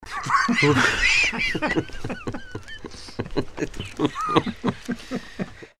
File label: laugh male